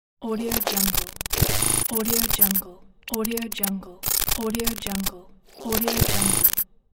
glitch; intro-boom

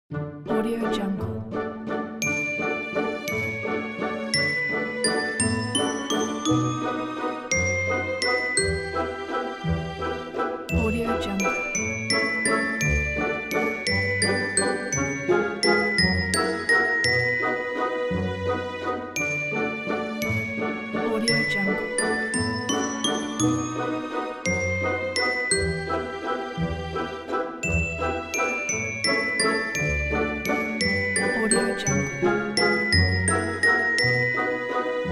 waltz

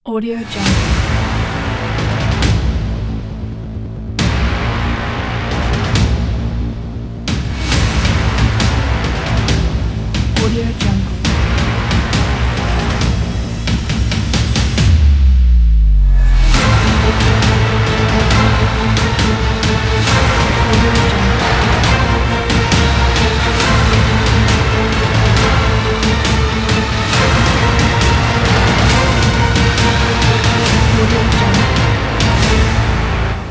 aggressive trailer teaser

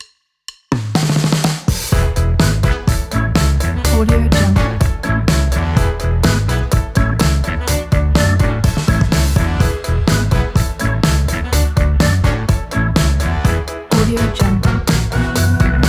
cartoon blues rock

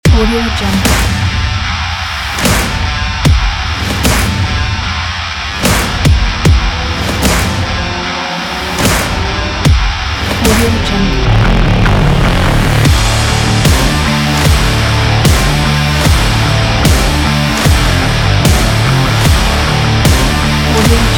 cinematic rock

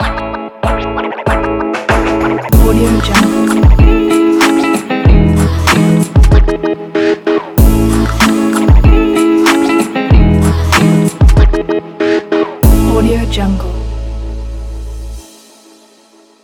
podcast intro opener

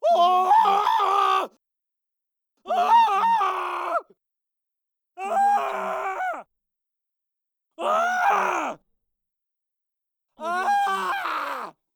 male torture scream pack

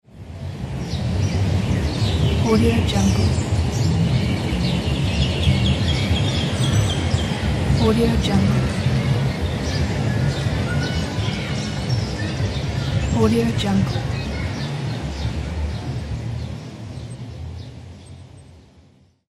park ambience

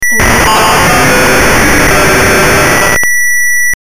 interference broadcast glitch

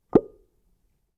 boink wet suction pop blip hollow; prosoundfx

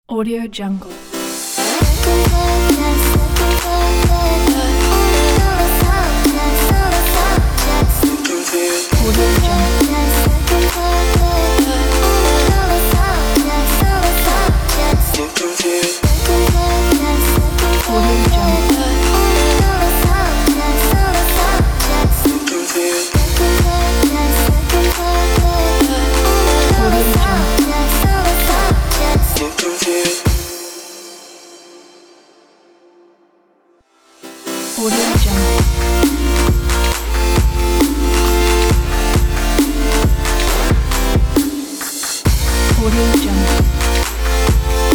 future bass

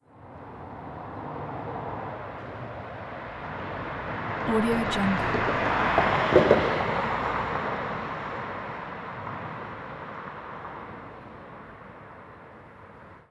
city street slow car passby

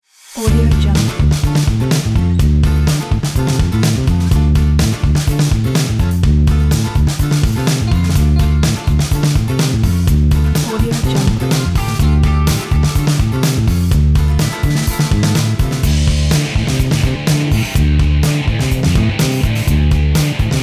positive creation; gorynich

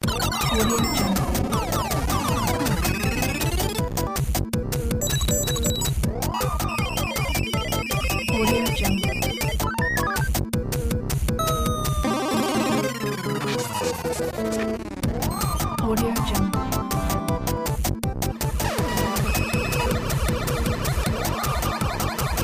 retro game music

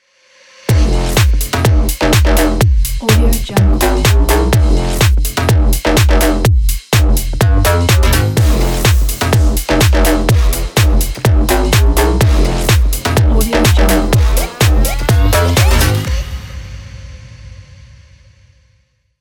fashion house ident

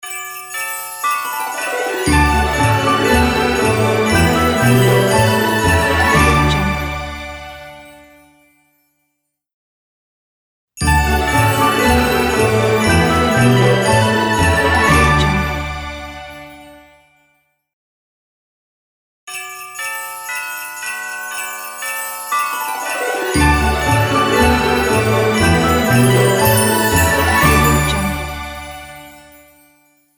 merry christmas logo

